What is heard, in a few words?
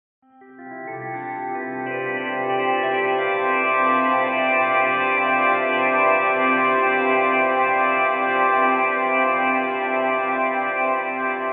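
dreamy heavenly organ style glissando reverb; sound design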